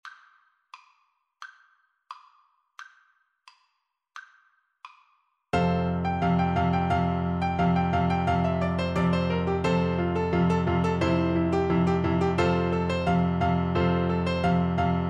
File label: Capriccio Espagnol, Clarinet